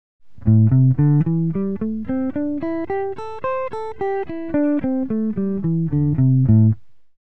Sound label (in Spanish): penta menor patron